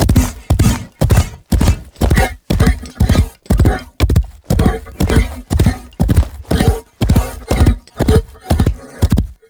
Horse Steps Gallop Loop Default; Warframe; Duviri Horse; Vehicles

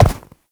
Step Snow, Movement; Uriel; Warframe

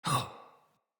Death, Battle Barks; Envoy

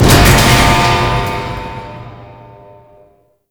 Shipwreck Rocket Start; Ambience; Gameplay; Grineer; Warframe; Tau

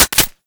Uzi Mech; Warframe; Tenno; Prime Tenno Uzi; Weapons

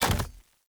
Short Sword Medium Impact Wood Hollow Large; Player; Environment

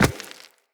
Walk Rock, Footsteps; Operator

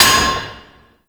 Worker Hammer Impact Metal; Warframe; Grineer

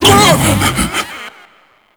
Pain, Vocals, Enemies; Warframe; Proto Infested; Ape Prelate

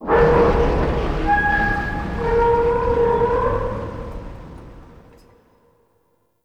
Ambient Tank Vocal; Nineteen Ninety Nine

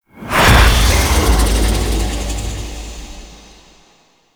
Sealab Stalker Spawn; Warframe; The New War

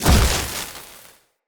Casting Device Block Parry; Weapons; Player

